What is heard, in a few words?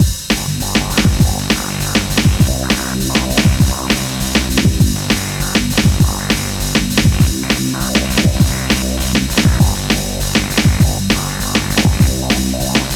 hiphop bass